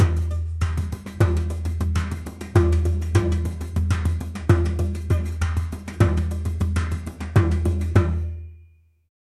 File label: powerful and energetic cr, festival